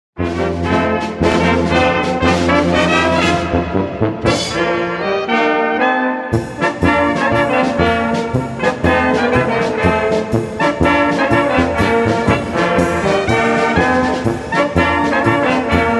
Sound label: Dixieland